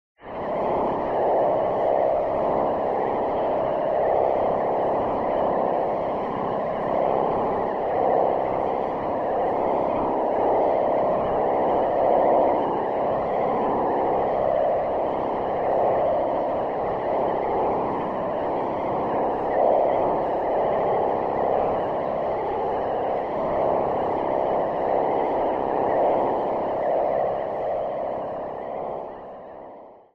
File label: Alarm Beep Pulse